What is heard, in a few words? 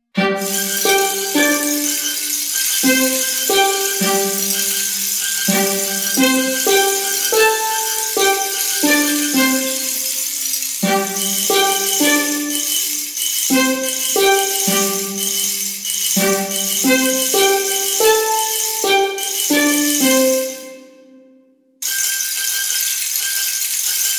Violin; Undertale